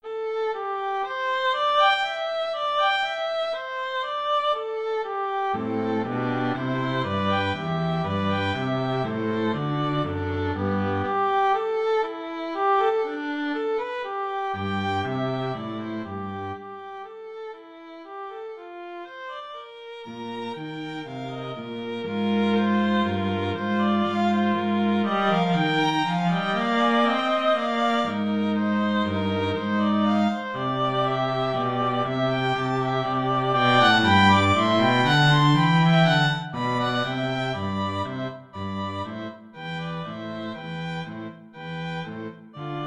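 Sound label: musorgsky trio string trio; 2 violins cello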